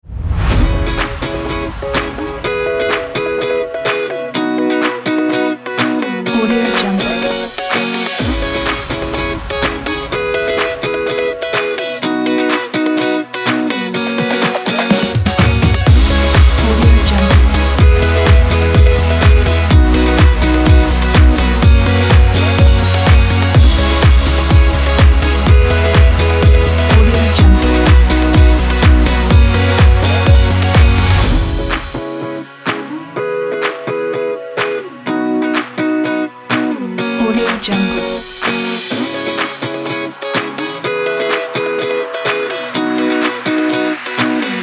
happy

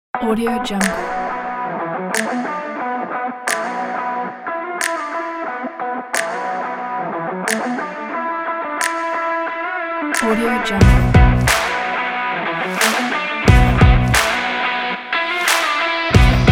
minimal rock

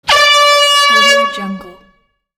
cartoon funny horn

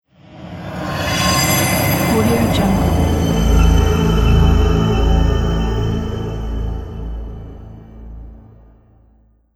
dark tunnel logo; omsound

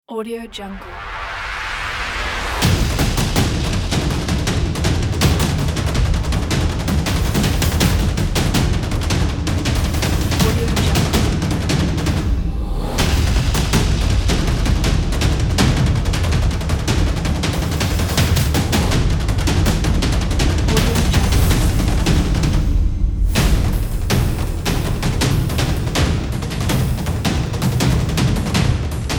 really fast action drums

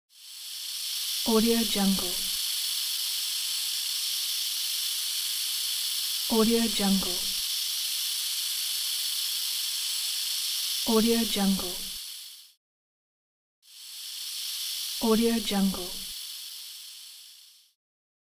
steam ambient

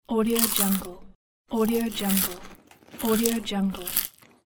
eating chips

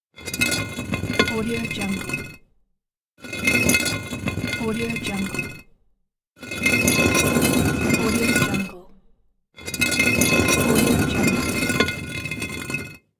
stone slide

